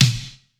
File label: japanese pop snare